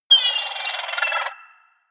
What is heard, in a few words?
seagull call squawk; natur-tiere; voegel